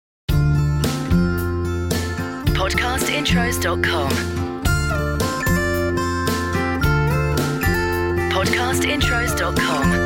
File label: CHILL OUT; Podcast Watermark